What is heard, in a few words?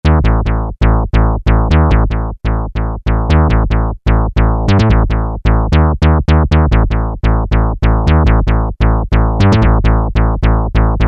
Club, Peavey DPM Spectrum Bass; 078 Club MTV